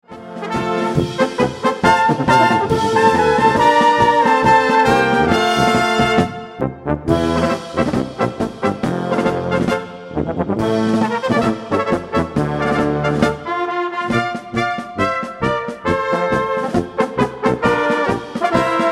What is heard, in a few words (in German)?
Marsch